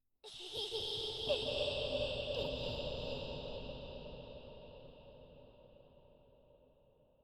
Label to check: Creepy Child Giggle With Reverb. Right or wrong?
Right